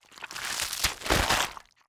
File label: gather meat